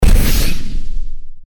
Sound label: rocket launch